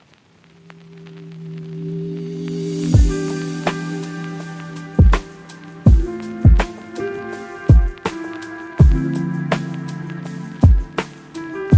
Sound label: Mellow Groove Lo Fi Chill, Smooth lo-fi background music blending chill beats, soft key